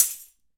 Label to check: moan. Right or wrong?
Wrong